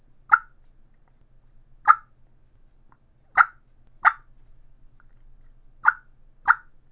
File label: eastern supreme mouth call clucks, turkey; Southland Sounds